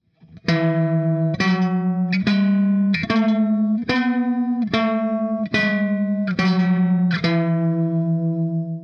Strat High Pickup Tone; Strat pickup height

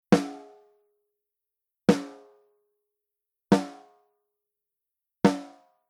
Shelford blue silk, snare drum; preamps

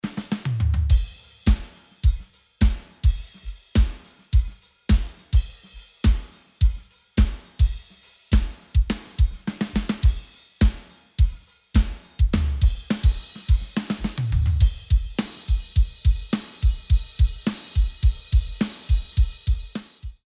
Drums; retro; pultec shootout

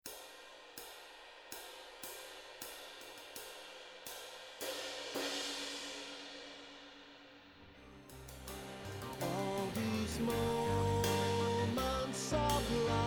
ride cymbal

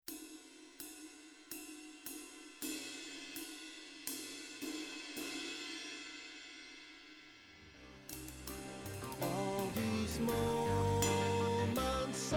ride cymbal

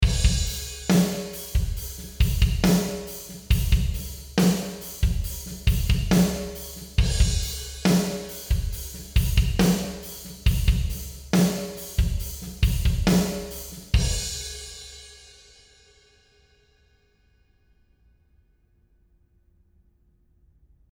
drums in warehouse AT450s

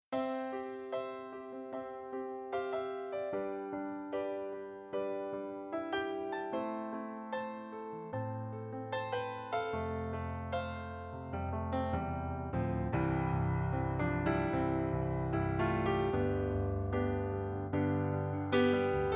Nord Silver Grand, digital pianos

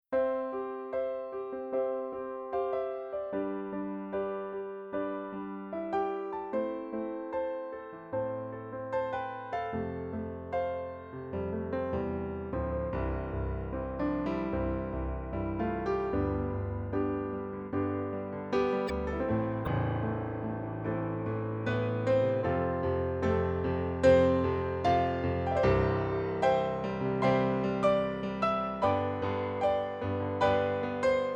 RD2000 NX Concert Grand, digital piano

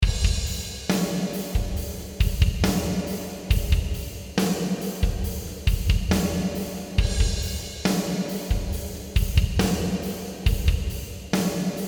drums racquet AT450s, snare

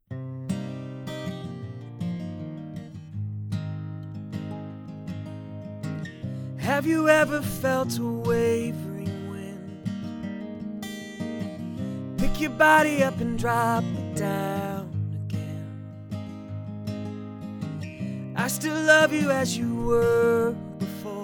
Music, iRig Mic Studio; usb mic; IKM

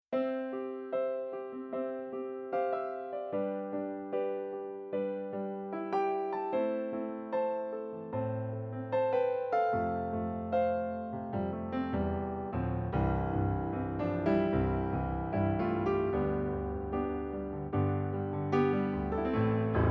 Grandstage Piano; digital pianos

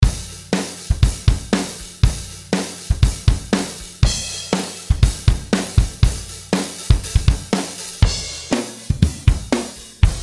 Drum Slice Tuning; melodyne

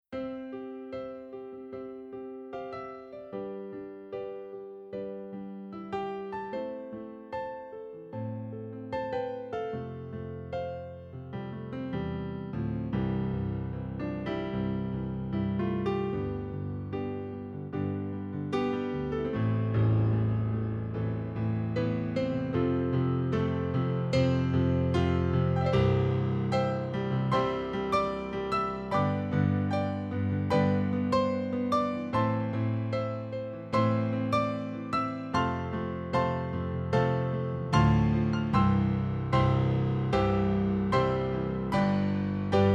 Gr Pno Concert

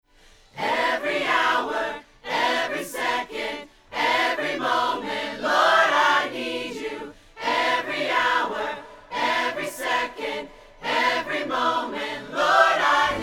choir sample; ksm44; how to mic choir

